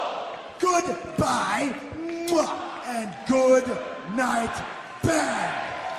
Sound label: Ringtone